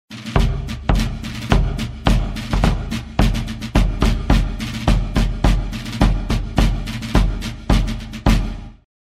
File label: Drums Of War, civil war